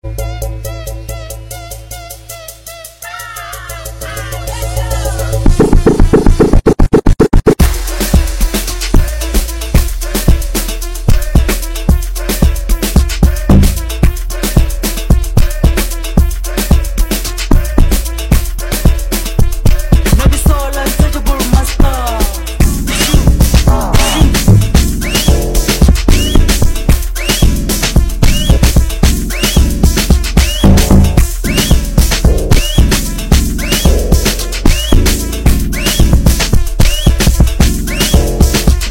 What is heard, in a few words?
Piano Anthem